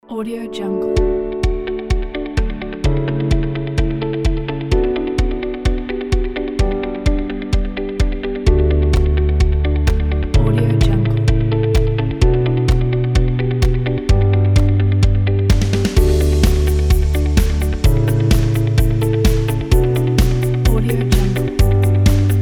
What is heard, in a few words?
corporate motivation